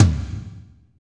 tom, Power Ballad; Northstar; Drumscapes Roland

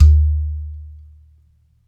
UDU, African Contemporary; Udus; Spectrasonics; Supreme Beats

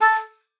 A4, OBOE; Xsample VOL-6

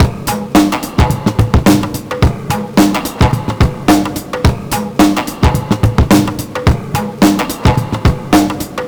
108 bpm, Breaks; POTBreaks

